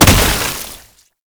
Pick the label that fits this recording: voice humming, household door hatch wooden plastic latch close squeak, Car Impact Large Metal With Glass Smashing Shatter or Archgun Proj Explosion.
Archgun Proj Explosion